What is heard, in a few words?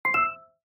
GLASS HEARD